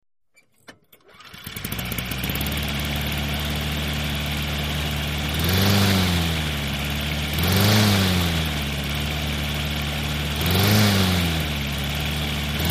Lawn Mower; Start / Idle / Off 2; Mower Starts, Followed By Fluctuating Engine Speeds, And Then Turned Off. Medium Perspective. Small Engine.